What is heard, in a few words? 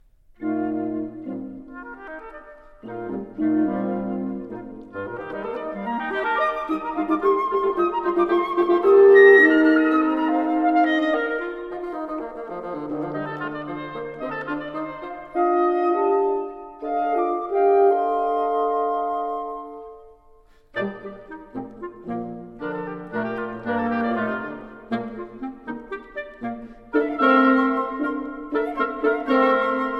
Partita For Woodwind Quintet; Mvt. 1. Introduction & Theme